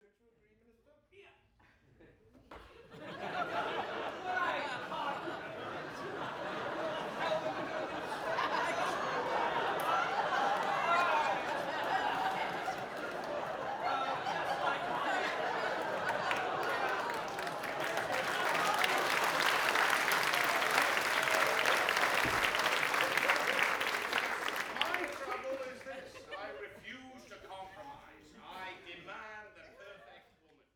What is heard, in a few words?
laffs